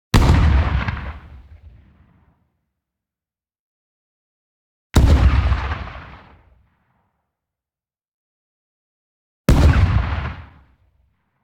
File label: EXPLOSION SHORT Midrange Bang; boom library; Anime Essentials